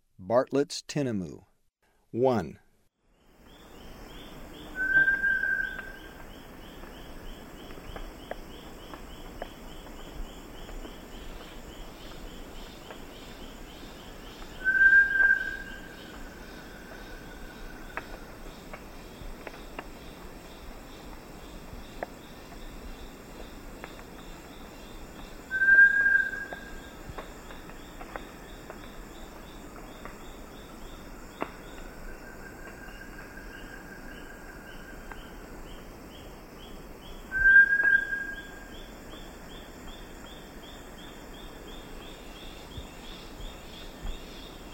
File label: Bartlett's Tinamou, Birds of Ecuador; S. America